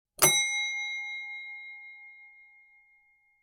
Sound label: Elevator bell ring tone